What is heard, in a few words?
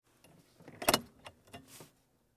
hand brake clicks ebrake, Mercedes Benz 280 SL